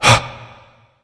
surprised; cupid

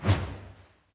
swing slow; smite; god odin

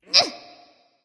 lift grunt; smite; awilix

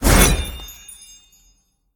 projectile; smite; olorun

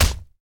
fire impact; smite; poseidon

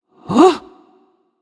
confused; Smite; bellona

